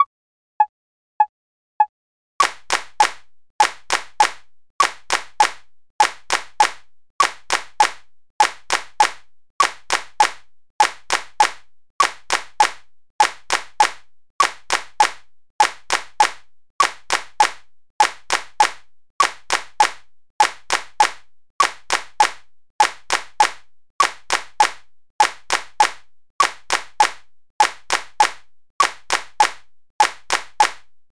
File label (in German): viertel achtel 100, rhythmik